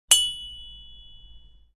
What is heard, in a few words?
Metal hit and ringing